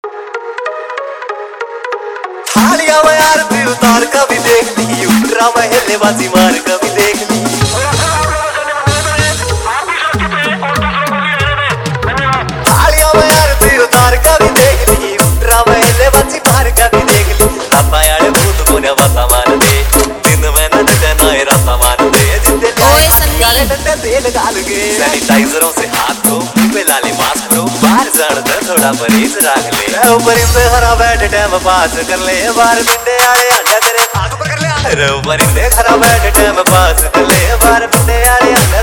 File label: 3D Brazil Bass Remix New Haryanvi Dj Song